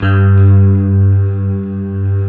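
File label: synthesizer, digital; Sound & Vision - Gigapack I CD 2 (Roland)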